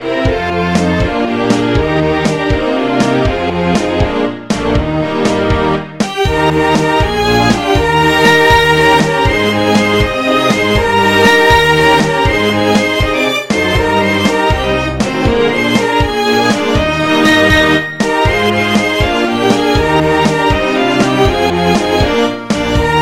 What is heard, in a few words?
Strings version